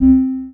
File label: menu item change selection